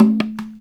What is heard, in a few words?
NAGARA; InVision Interactive